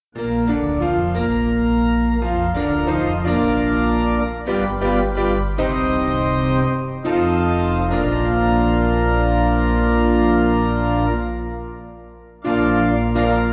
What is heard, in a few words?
Piano